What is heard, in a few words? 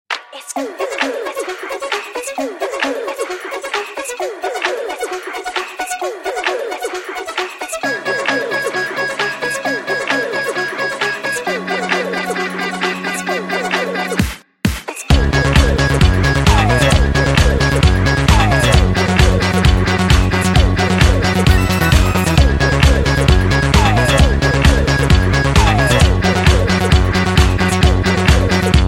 Disco